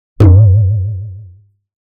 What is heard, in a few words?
Plastic Tub Impact With Water Hit Pulsating Low; Hollywood Edge; sound designer's toolkit v2